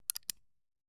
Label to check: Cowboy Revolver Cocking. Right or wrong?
Right